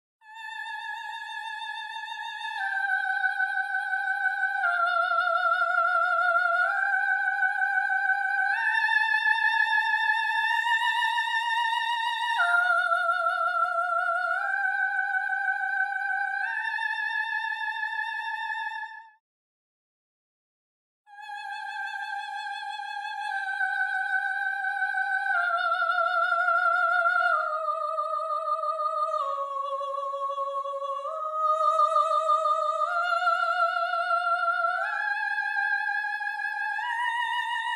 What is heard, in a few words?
Soprano